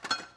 pick Pan

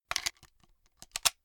uzi Reload

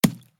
hit Dirt

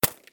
vector

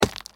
hit Stone

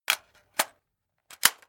reload Assault

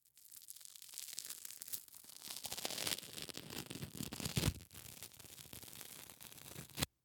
medikit

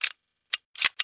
p1911 Reload

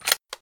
kar98 Reload End

